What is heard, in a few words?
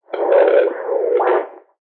Radio Static Scratch; Nasty static noise; Noise Sound Effects; Sound Effects:Electronics